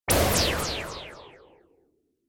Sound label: Laser Swish Delay, whoosh sound effect